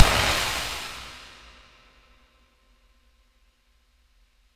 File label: RAIN RIDE, DRUM N BASS KIT; DRUM N BASS:JUNGLE BEATS; DRUM N BASS KIT 2